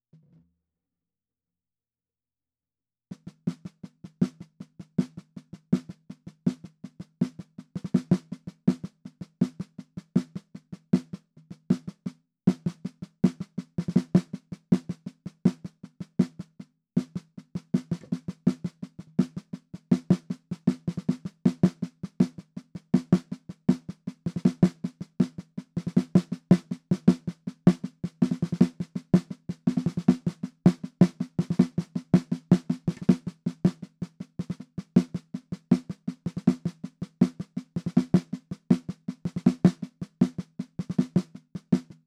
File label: perc intro snare